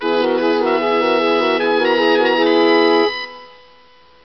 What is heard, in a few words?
Fanfare Lydian